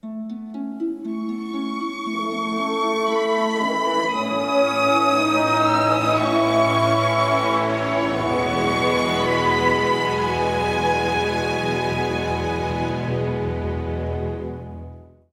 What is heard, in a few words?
Tune; Kameo